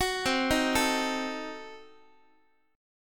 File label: C Diminished 7th, Guitar, chord; arpeggiated; JGuitar